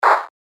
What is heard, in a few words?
Clap Mech; revealed claps snares vol 2